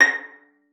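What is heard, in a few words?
violin pizzicato A#6; Xsample VOL-5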